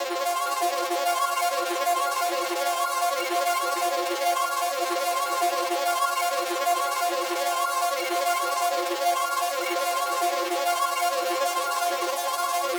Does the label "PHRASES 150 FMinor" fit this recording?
Yes